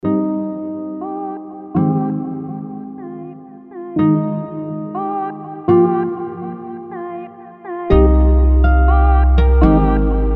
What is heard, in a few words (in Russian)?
luchshiy zvonok na budilnik; Лучший звонок на будильник